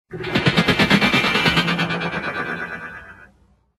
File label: BIONIC SOUND EFFECT stereo